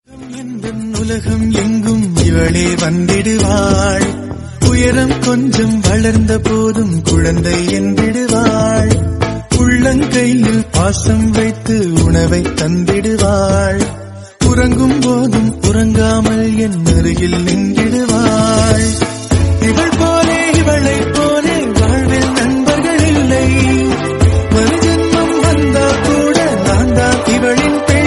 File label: Tamil Sister Ringtone